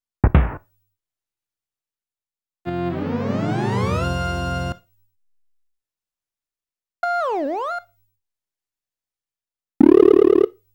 Door Closing Sound ~ Into the Keyhole ~ Goal Whip ~ Magic Beam Sound ~ Lava Bubble; super mario world live; Lava Bubble Escape